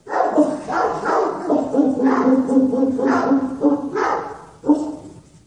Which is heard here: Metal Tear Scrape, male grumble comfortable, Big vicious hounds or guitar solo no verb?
Big vicious hounds